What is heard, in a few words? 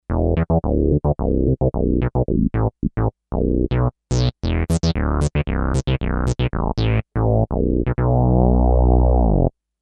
PWM bass, Kawai K5000S